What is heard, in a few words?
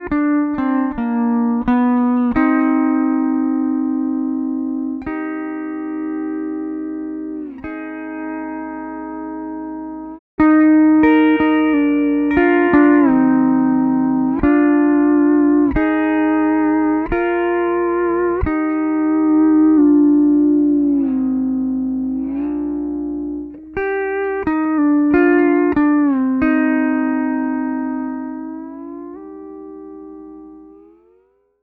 Pedal Steel